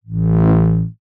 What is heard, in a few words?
speaker Pulse; wave interference